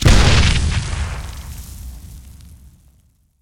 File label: explo grenade; PremiumBeat; Explosions